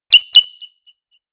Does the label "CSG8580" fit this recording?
No